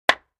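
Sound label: 2x4 Hit Hammer On Cement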